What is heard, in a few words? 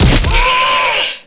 hit goat; animal; Tony Hawk's Project 8; XBOX